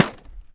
Baseball Bat Hit; car simulator 2

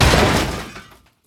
Car Damage Medium; gamerip; Car Simulator 2; android

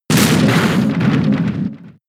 Rock Smash; Pokemon; gen 6; XY; ORAS

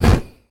Disappear; Doodle Jump 2; Android

